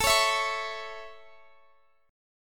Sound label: A Major A, Guitar Open F; strummed